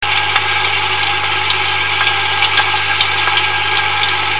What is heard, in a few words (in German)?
laut